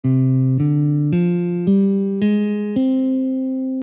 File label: pentatonic; global scales